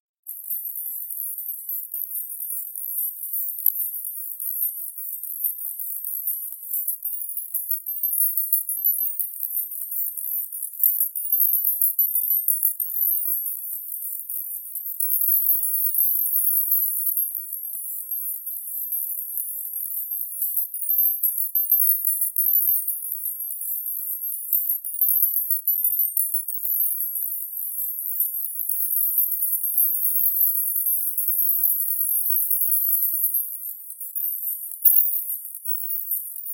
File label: 12k distractor, tinnitus maskers